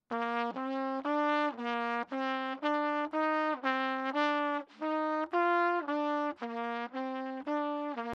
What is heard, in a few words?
double slow